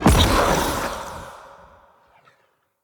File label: Vassal Laser Impact; Enemy; Characters; Abilities; Mendicant; Mnd King